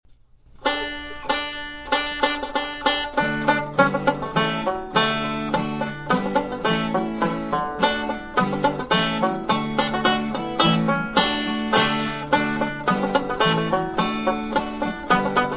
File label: polka; john ryans